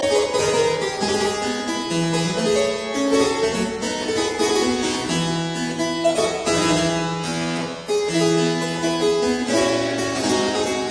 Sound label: Keyboard Suite in D minor Gigue; Froberger; Arthur Haas